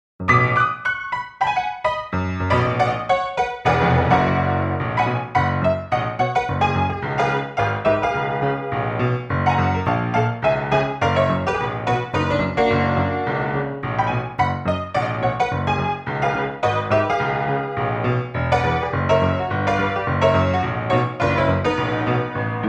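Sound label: bad keys boogie, synclavier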